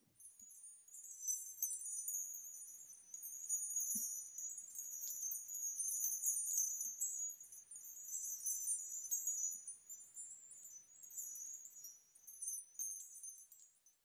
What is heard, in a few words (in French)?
Clochettes; le nid sonore